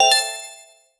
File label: notifications, sound-effects